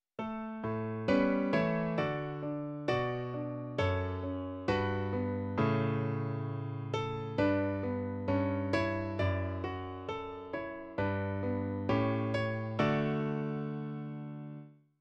octaves no voice

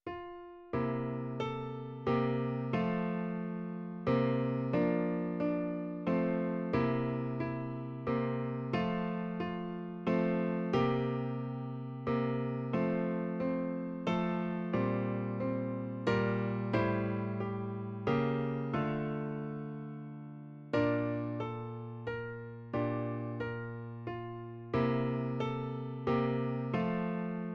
rising intervals happy no voice

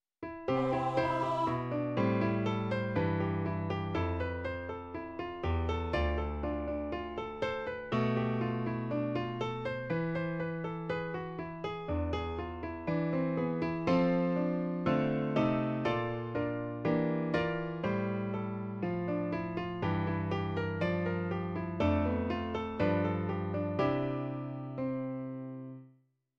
Arpeggios one equal temper no voice

